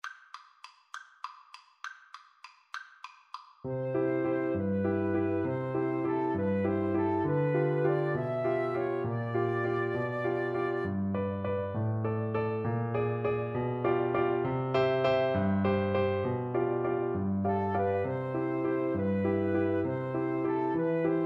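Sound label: waltz, flute violin karaoke; Violin-Flute Duet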